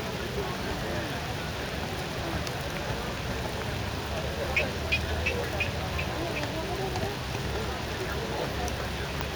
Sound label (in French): Refus d'obstacle